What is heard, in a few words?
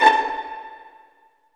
STR PIZZ, String Sections, Violins Pizz; Roland LCDP13